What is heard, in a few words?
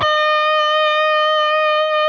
PRS E, PRS LEAD, Guitars; Spectrasonics; Hans Zimmer Guitars VOL-1